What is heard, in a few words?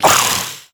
bold hiss, headcrab; creatures; headcrab classic; hideout